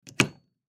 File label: Pool Ball Rack, Pool Ball Place Cue In Rack; SoundEffects-Blastwave; Sports